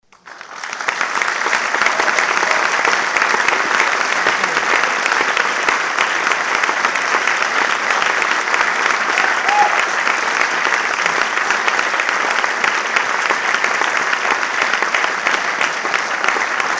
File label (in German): konzert